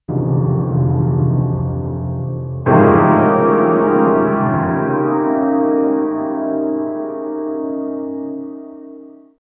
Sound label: a piano playing but at, broken